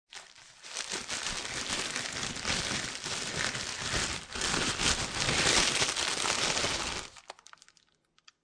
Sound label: Foley, Bread Grabbed Out Of Plastic Bread Bag; Food Prep